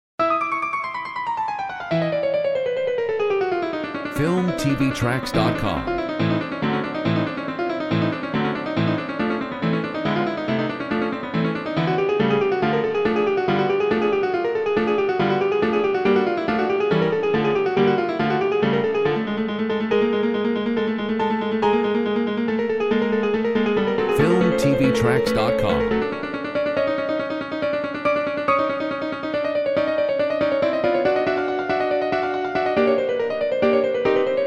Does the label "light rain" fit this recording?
No